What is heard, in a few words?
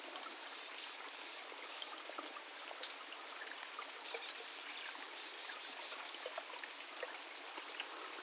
bird dolphin